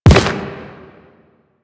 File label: Step Rock, Footsteps; Warframe; Quests; The New War; Kahl